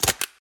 scope pickup

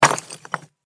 stone destroyed; hits

